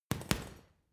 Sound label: boxing punching bag hit double rattle; sport-freizeit; boxen